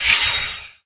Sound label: sun get coin; ROMAN